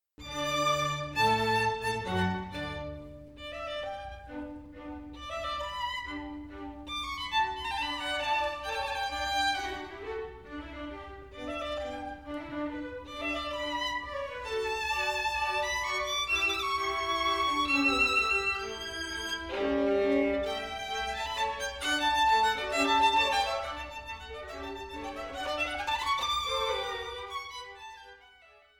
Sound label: Violin Con D